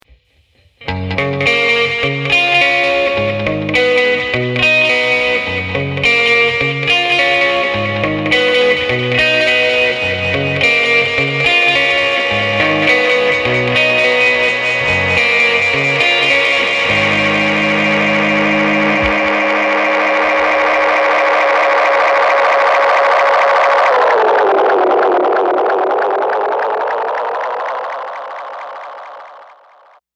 E Guitar Picking Tweed Tape De

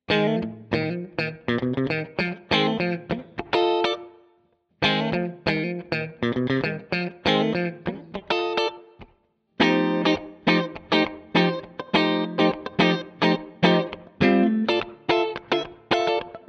Epiphone Les Paul Both Split Clean

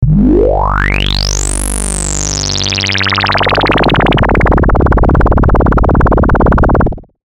Lead LFO

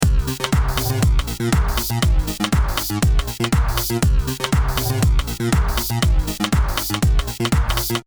beat; 1970

